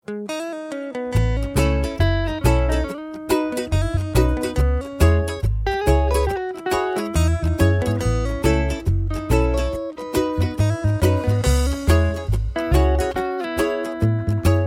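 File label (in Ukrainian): liryčna melodiia na gitari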